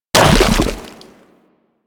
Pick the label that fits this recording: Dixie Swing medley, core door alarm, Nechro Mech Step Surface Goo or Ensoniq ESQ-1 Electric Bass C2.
Nechro Mech Step Surface Goo